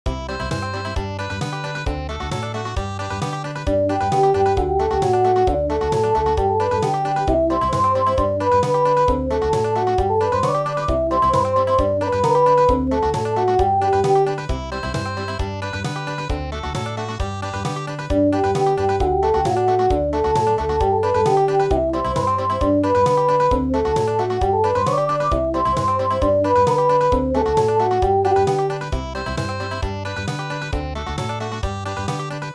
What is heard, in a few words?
swing Country